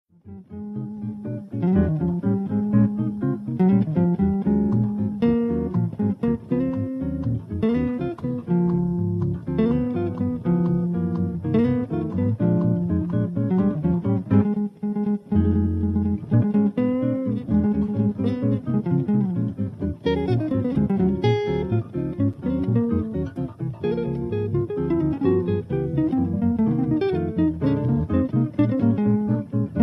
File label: mellow tone, horns